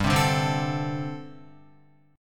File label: G Suspended 2nd Suspended 4th, Guitar; Gsus2sus4; strummed; 2,3,2,5,2,5